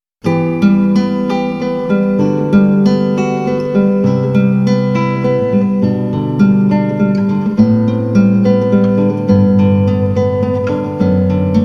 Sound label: guitar etude